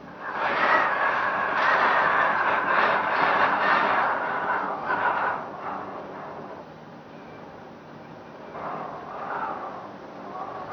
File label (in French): courant d air